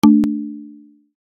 Info pop notification help app

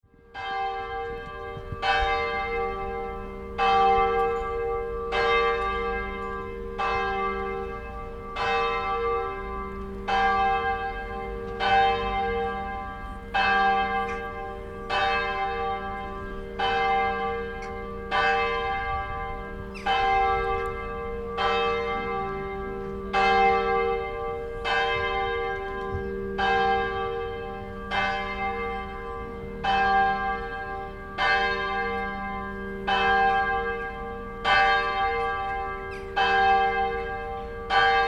Suburban sunday town ambience with church bells

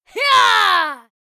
Female warrior shout

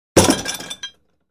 Barbell drop on the ground

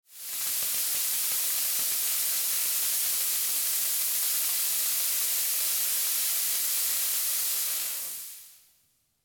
Event smoke machine fogger running; machinery

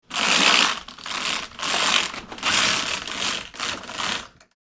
Roller blind cord pull roll down; blinds

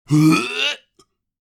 Aged man gagging before vomiting; Puke and Vomit; gfxsounds

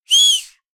Human whistle short fingers call attention

